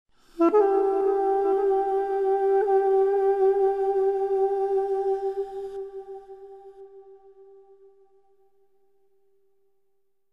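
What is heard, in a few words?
theme melody; Myst III Exile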